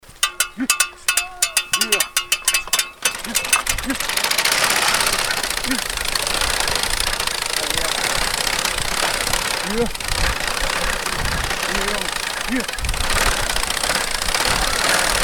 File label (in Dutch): grasmaaien